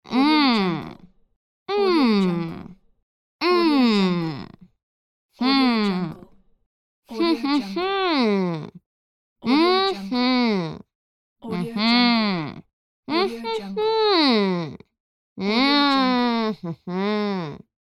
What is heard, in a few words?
female mmm expressions